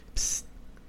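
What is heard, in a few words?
pssst whisper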